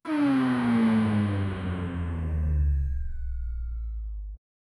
a sudden short and high p, failure

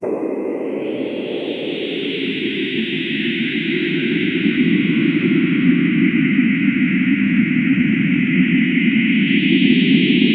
someone pressing buttons, spaceship

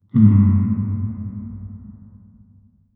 music dark ambient bass, reverb tail

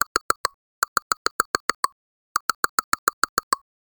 a remix beatbox consistin

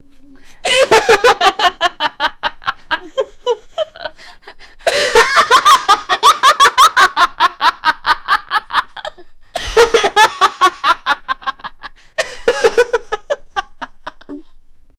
laughing two women and on; boy

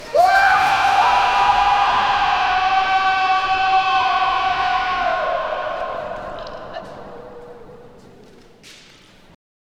someone shouti, panic

